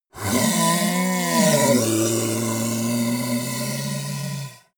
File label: monster dark growl hoarse; kreaturen